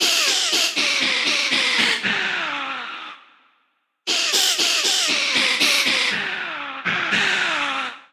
Metal Mouth G Minor 118 bpm, Voice Box; MIDI Clips; Chromatic; Ableton; Factory Packs; Transformed

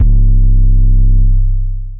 Boom; 2-Way Boom; Booms; Ableton; Beat Tools

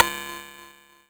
digitone pop; tekPop; Ableton Foundation FreePacks; Wicked Kits

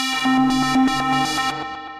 Synth Rhythmic; City Motion; Ableton; Glitch and Wash

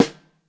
Top, Snare; Ableton Foundation FreePacks; Snare Designer

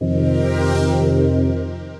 pad, Retro Synths; Nanopad; Ableton; P-50 Linear

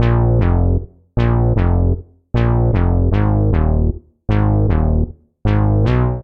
Bass Bb minor 77bpm; MIDI Clips; Bassline; Freak-o-saur; Ableton; Factory Packs; Chop and Swing

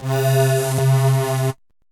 Attack PVM, Synth Lead; Ableton; Skitter and Step

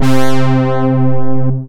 80's Synth Brass; Ableton; Factory Packs; Synth Essentials; Analog

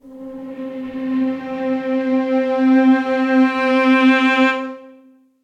Intimate (Slide) - String Quartet; Instruments; MPE; Ableton; Factory Packs; String Quartet by Spitfire Audio

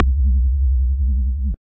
Sub Killer, bass; Ableton; Glitch and Wash

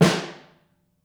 Snare Room; Ableton Foundation FreePacks; Snare Designer

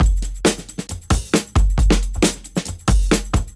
Drums; Suicide Drums; Ableton; Breakbeats by KutMasta Kurt

